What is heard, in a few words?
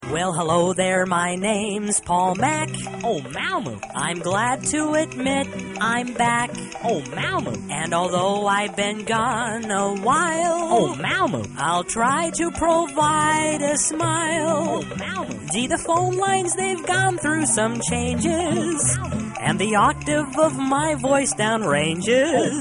song